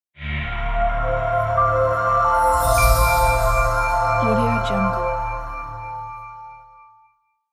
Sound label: elegant simple ident